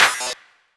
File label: clapp; Futura; R2; Ableton Foundation FreePacks; Wicked Kits